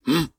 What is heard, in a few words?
Shield